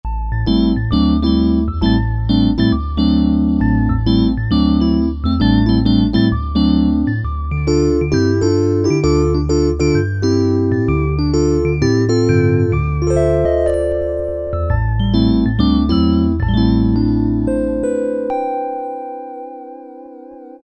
Piano; Cheetah MS6

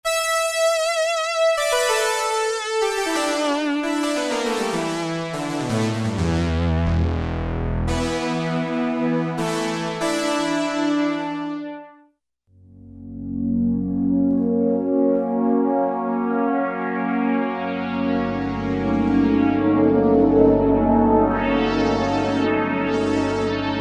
Twelve Moogs; Optical Media International Sonic Images Library

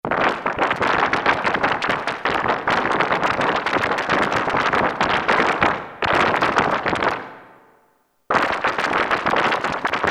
HANDCLAPS, Roland Jupiter-8; Factory patches

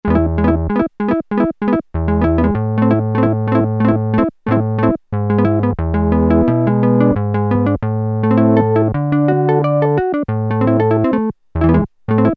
Guitar, Korg PolySix; Factory Presets Bank A

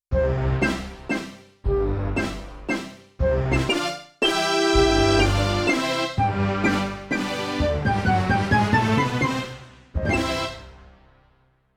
Big Orchestra, Yamaha TX16W; Orchestral Performance 1; Big Orchestra III

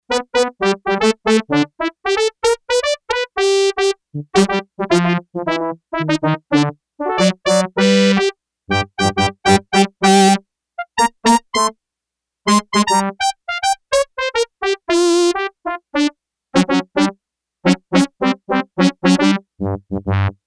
Hard Trumps, Yamaha TX802; Factory Patches; Voices

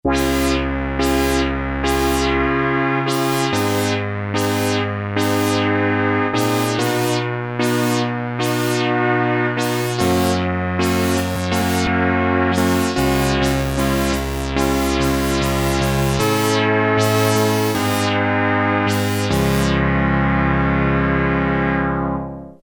Symphonic Ens, Casio MT-600; Tones

